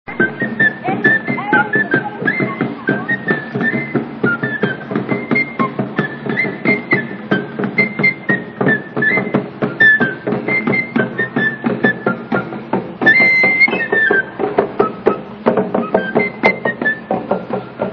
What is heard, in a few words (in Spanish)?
tambores y chirimilla